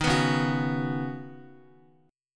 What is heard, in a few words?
C sharp 7th Suspended 2nd Suspended 4th C sharp down Guitar; C#7sus2sus4; strummed; JGuitar